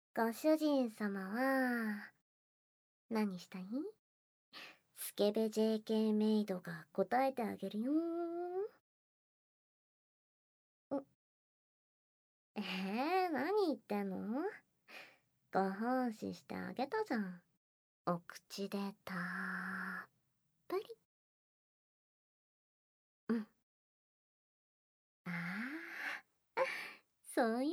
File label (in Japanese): 耳舐めご奉仕、授乳手コキ; 突然の甘やかしタイム